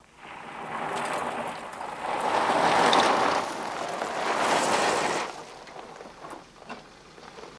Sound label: Car Drive By Gravel Th